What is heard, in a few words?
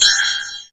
Perc, Alesis DM5; Drums